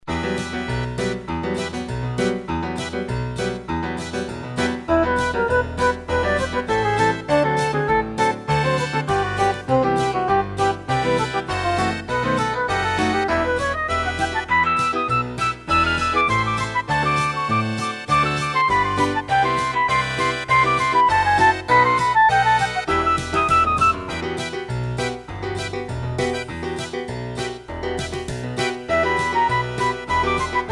klezmer; petit dance

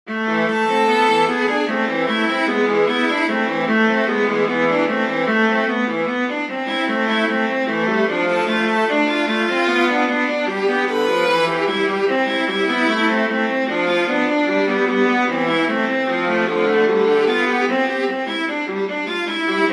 invention a minor for viola duet; Johann Sebastian Bach

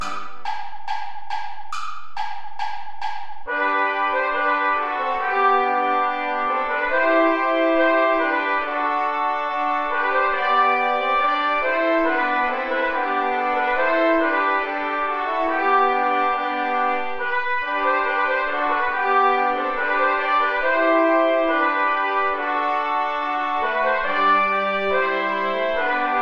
play along without trombone; praetorius michael ballet

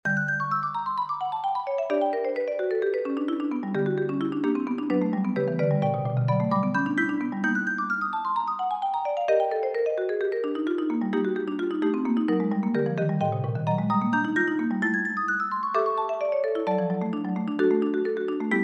etude for marimba duet; tchaikovsky piotr ilitch; from morceaux

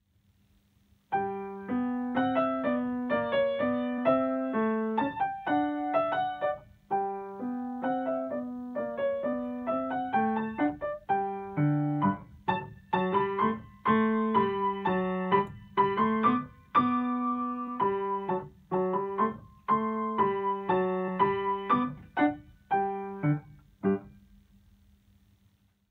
menuet german dance; heidtmann klaus

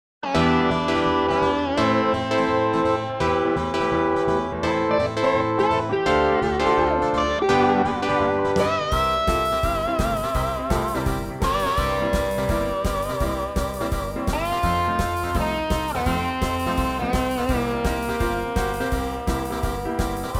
percussion

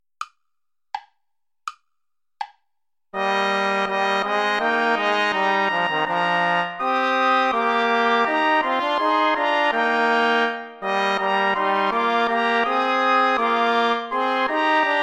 play along without trombone; praetorius michael; herzlich tut mich erfreuen

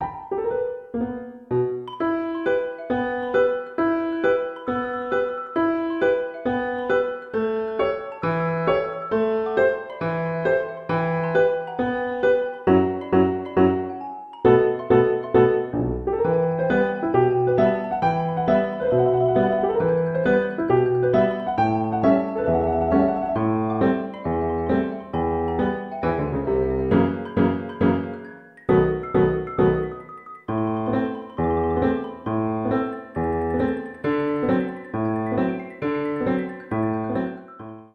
finch bird for xylophone and piano

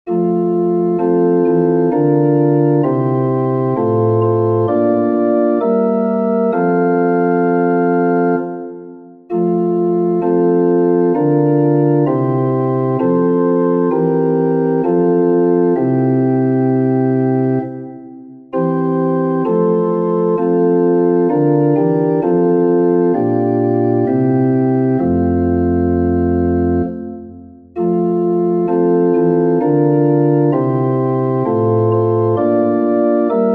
chorus for pipe organ; bach johann sebastian; verruchter knecht denkst hin

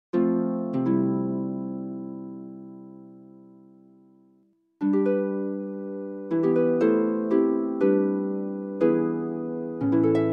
for viola harp

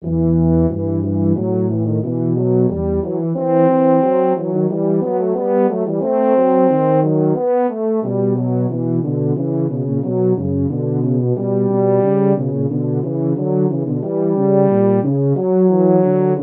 arioso for baritone horn duet; bach johann sebastian; wisset ihr nicht daa ich sein mua

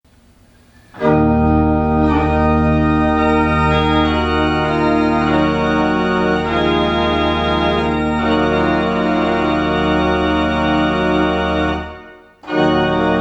toccata

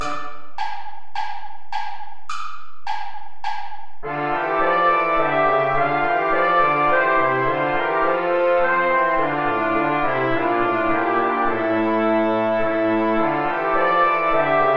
play along without trombone; bach johann sebastian; ich steh deiner krippen hier

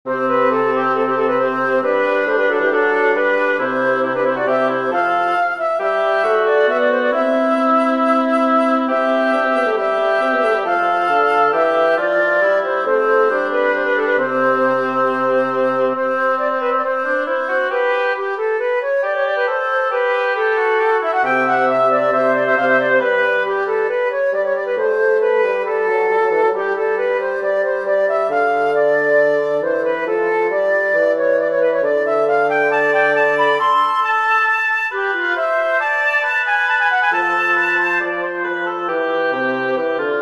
fantasia major for woodwind trio

